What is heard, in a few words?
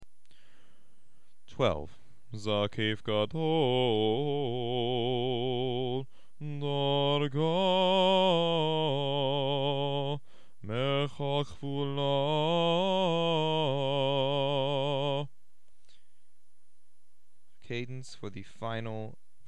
Haftarah Trope F